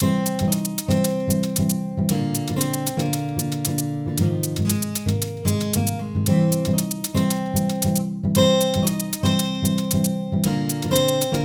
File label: dryer montage song